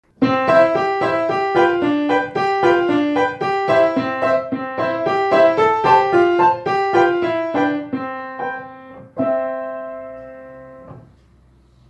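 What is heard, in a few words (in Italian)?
Piccola polka, pianoforte; Kabalewski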